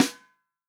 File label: FUNK SNARE CHROMATIC, REAL DRUMS